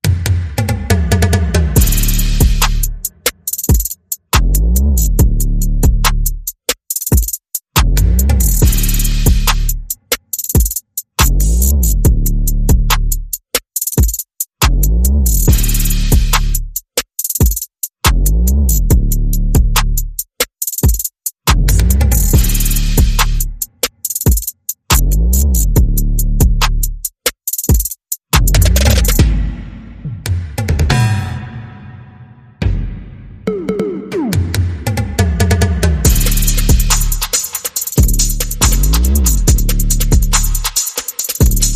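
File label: Bass and Percussion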